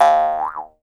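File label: Jaw Harps, Pitched Percussion; E-MU Producer Series Vol. 7 – Old World Instruments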